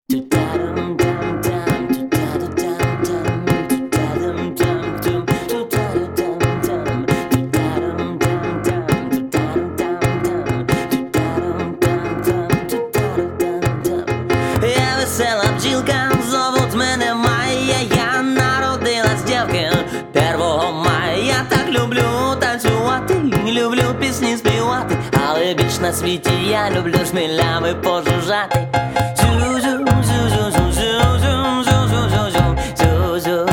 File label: piano version live sound